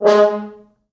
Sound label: 4 FrHorns